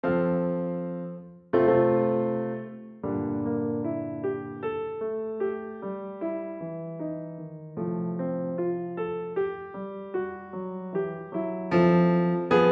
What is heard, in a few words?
Piano Suite Arioso